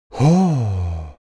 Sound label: human vocal male sigh impressed gentle